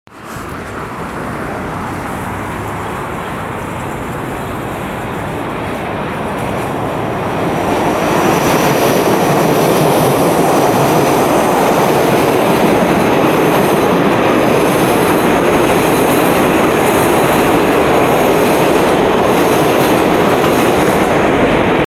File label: train